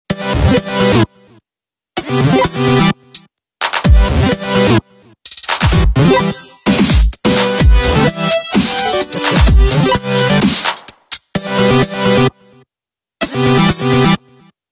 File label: Fizz, Ringtones; Nokia; 515